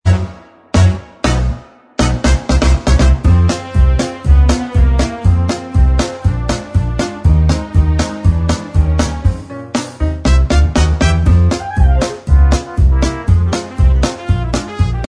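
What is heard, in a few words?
instrumental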